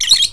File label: BIRD